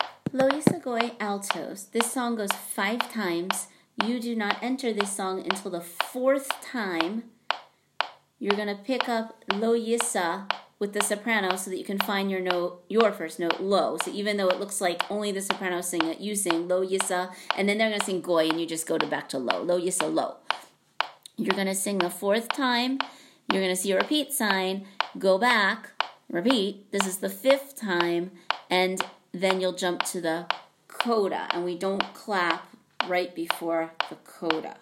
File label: Alto; High Holidays; Lo Yisa Goy